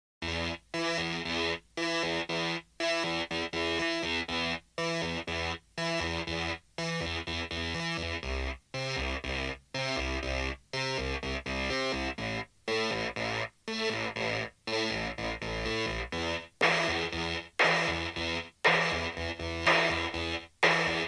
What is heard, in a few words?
metal